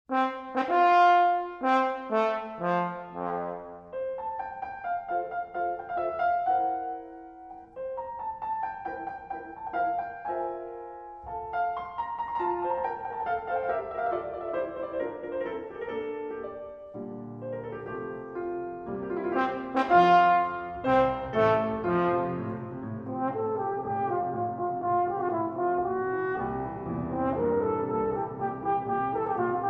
Sonata For Horn & Piano in F Major - Mvt. 1. Allegro